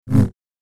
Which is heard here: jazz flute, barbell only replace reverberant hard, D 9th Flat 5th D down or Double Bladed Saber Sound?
Double Bladed Saber Sound